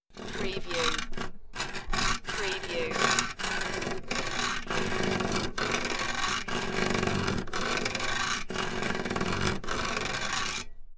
Small Metal Chain Rubbing

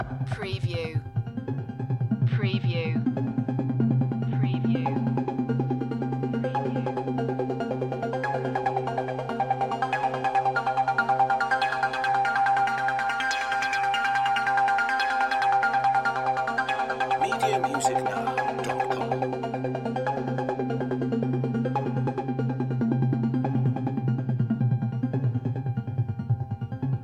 Techno-Trance Loop in C 142 BPM; lynne-publishing

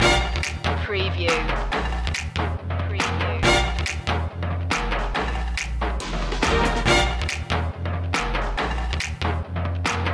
shuffle kerfuffle loop

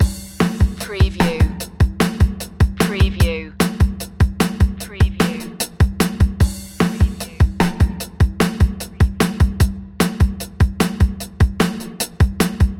latin flavor loop

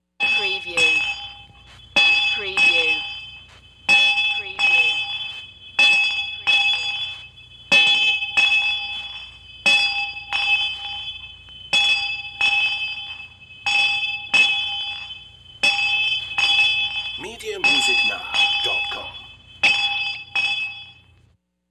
Town Criers Bell

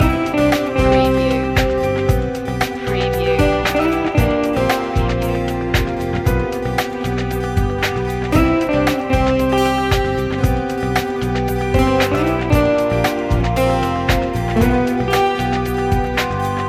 corporate achievement loop

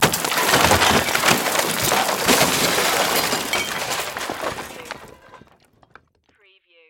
Crash Debris

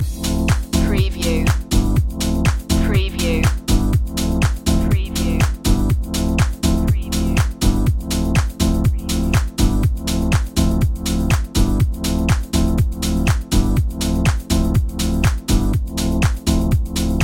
deeper grooves

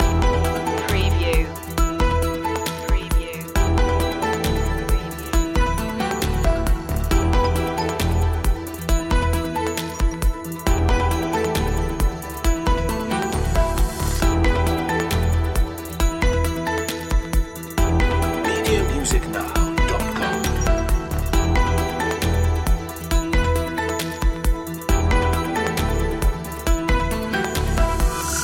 digital environment loop

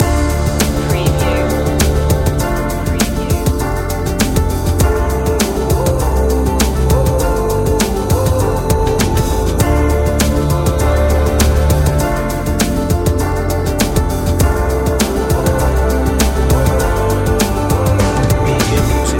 tribal trip hop loop

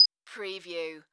Multimedia Beep

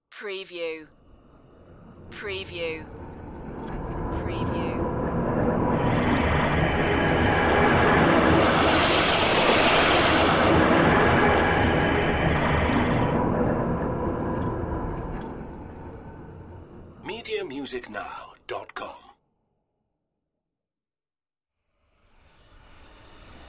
space storm swooshes

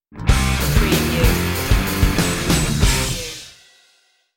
rock steady stinger

